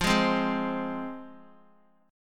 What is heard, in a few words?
F Minor Guitar, chord; strummed; x,8,6,5,8,x; 4E,4A,5D,5G,5C,6F